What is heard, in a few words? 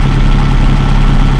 airflow; Mafie 1